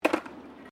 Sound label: SKATEBOARD SKATE BOWL DROP; Skateboard sounds; Skateboarding; Sports; Foundation4